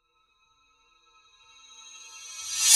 Swell Metallic; bottlerocket

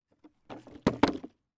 wood panel movement drop

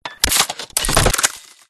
MECHANICAL MORPHS; PMSFX

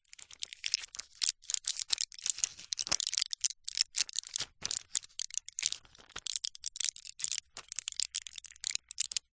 household medicine foil blister pack handling; zapsplat

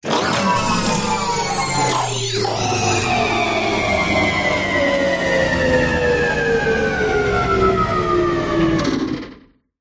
science fiction robot power down

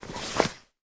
raincoat hang on hook; foley

